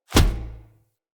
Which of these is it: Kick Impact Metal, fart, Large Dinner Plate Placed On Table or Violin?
Kick Impact Metal